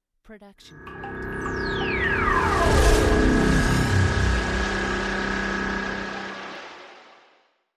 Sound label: designer magical hit; SoundsCrate